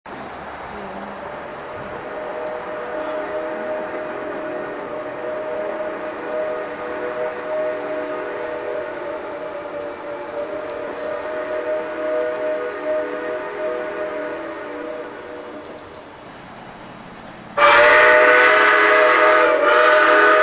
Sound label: Chime, steam whistles